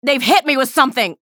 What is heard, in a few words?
pain ability damage; athena; low health